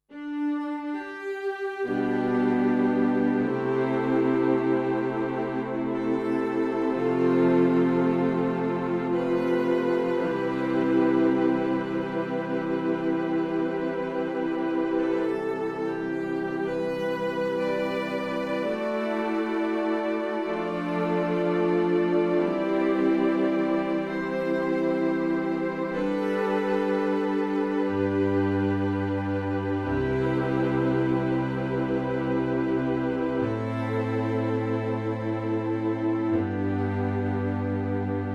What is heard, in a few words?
MX49 Strings